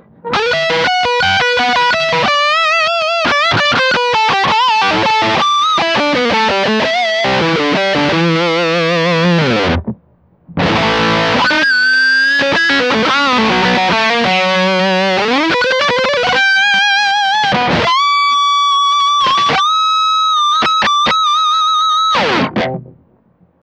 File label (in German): Soundvergleich Highgain Lead Ibanez RG631